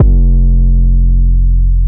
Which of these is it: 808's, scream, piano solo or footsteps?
808's